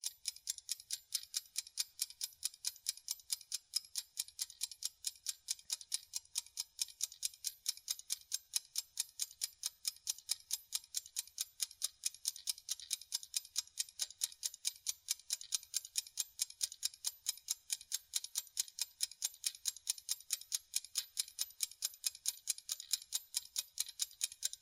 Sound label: Timer; Magic & Mayhem: The Art of Magic